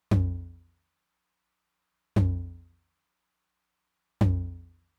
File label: Low Tom, Roland TR-909